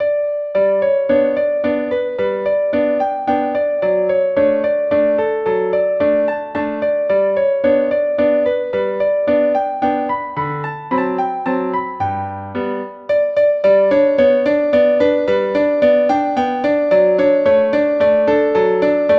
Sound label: Waltz; PDMusic Volume 1